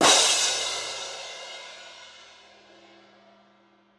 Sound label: Cymbal, Orchestral Percussion One Shots; Sonic Foundry (Sony Creative Software); Orchestral VOL-2